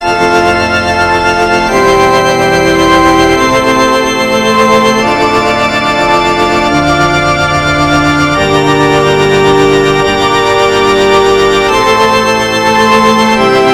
Esercizi di contrappunto a tre voci; Erste Gattung; Ronge pagina 61

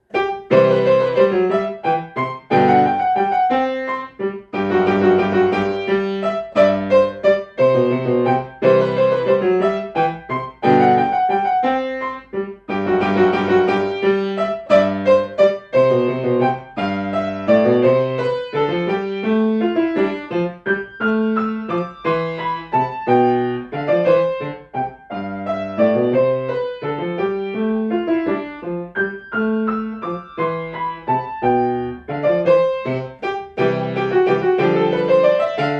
Minuetto con Trio; allemandes no 1 e 2; Kerman 202

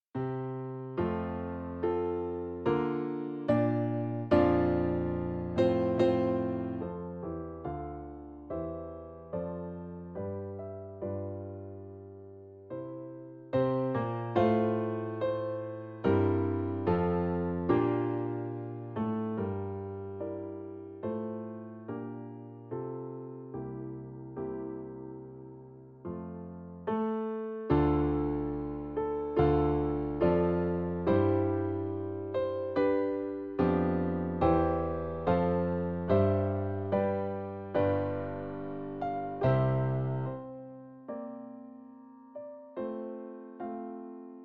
Andante con moto per pianoforte; Goldschmidt Sigmund

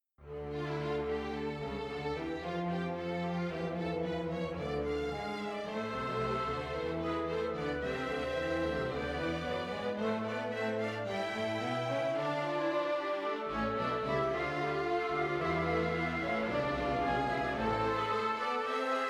Doppia Fuga a quattro voci in re minore Orchestrazione; di Gerd Prengel

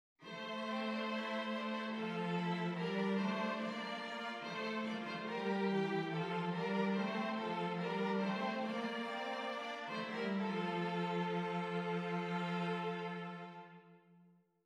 esercizi di doppio contrappunto nella decima a Tre; Ronge pagina 183

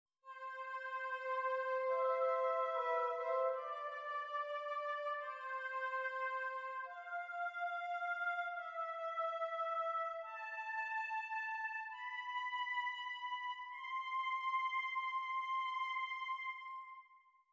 esercizi di doppio contrappunto nella decima; Ronge pagina 171

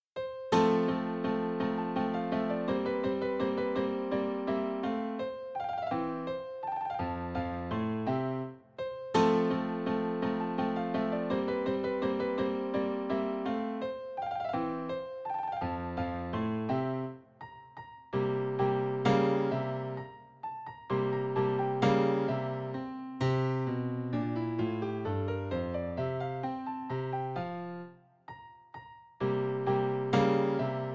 Contraddanza versione per piano, fa maggiore; Contraddanza per orchestra in fa maggiore WoO 8 numero 3

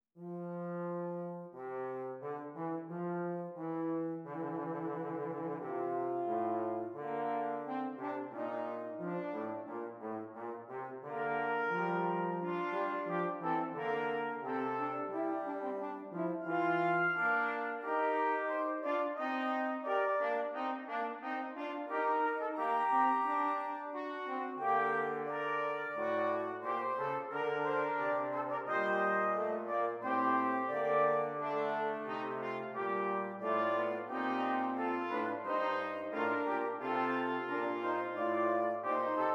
Fuga in fa maggiore; Hess 243; prima versione; Nottebohm numero 2

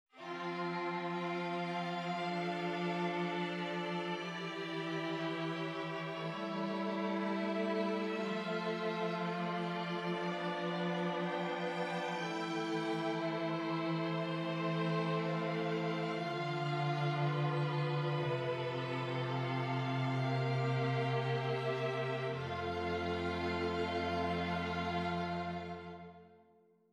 esercizi di contrappunto semplice A quattro voci; Ronge

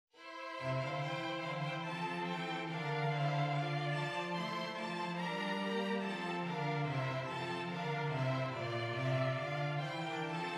esercizi di doppio contrappunto nella decima a quattro

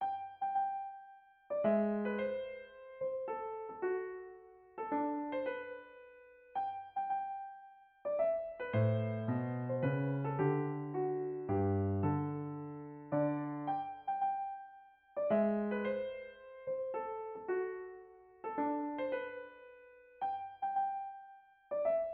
Pezzo probabilmente per pianoforte

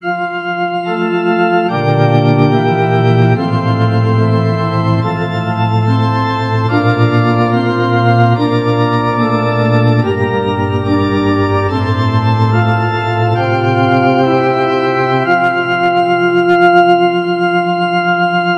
Esercizi di contrappunto a tre voci; Zweite Gattung; Nottebohm pagina 51

